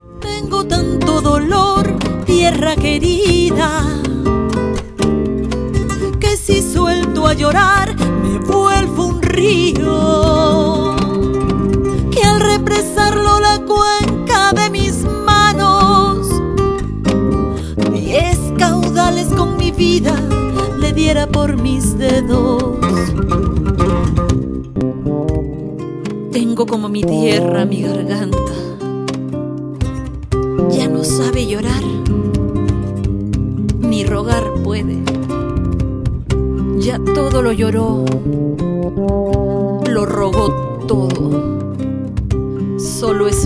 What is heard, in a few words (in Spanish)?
el grito hundido